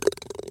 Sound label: in cup; toystory; rex